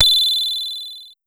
Pollard Syndrum

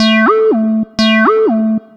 Analog Synth Pattern

